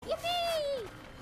Yippee

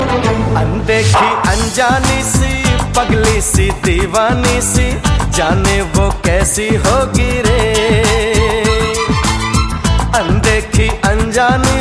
Male

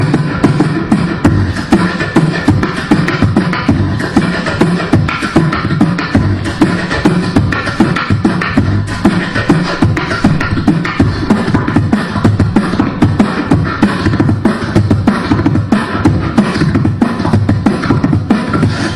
Beatbox

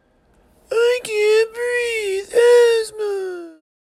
Asthma funny

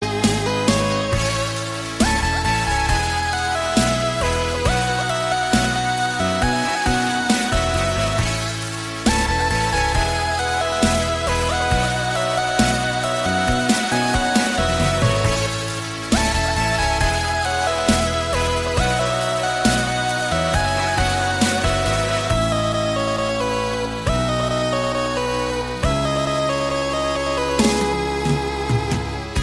8bit

